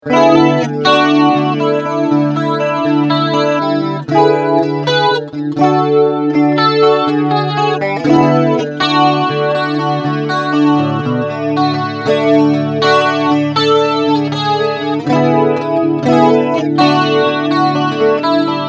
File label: Quad Chorus